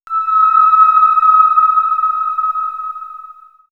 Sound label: Falling Star, ambient synth fx; X-Static Goldmine